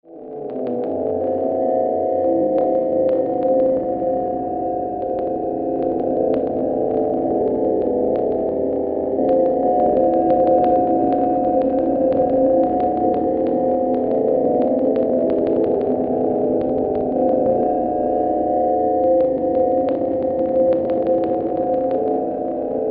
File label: Autotune My Drone; Gutter Muck